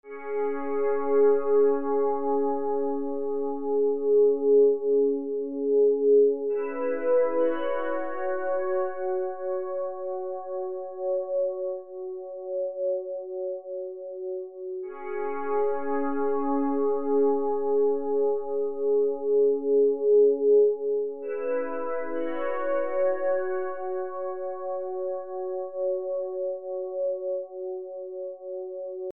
122 A FLAT MAJOR PAD

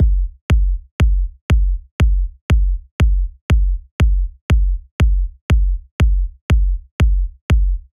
Kick Loops 120

